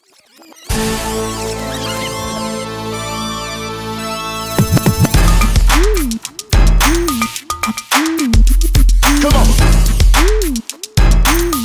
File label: Rock Ya Body Beat; NBA Live 06; PlayStation 2; NBA Live 2006 Beat